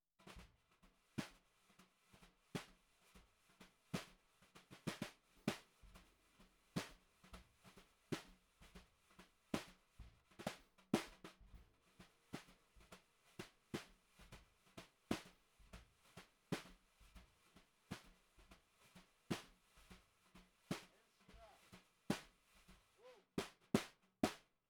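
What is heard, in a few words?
Snare Bottom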